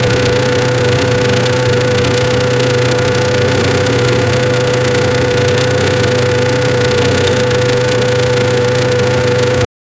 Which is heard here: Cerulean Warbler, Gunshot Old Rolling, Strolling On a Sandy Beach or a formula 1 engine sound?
a formula 1 engine sound